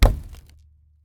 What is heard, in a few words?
chop door; Zomboid 41.78.16; Project Zomboid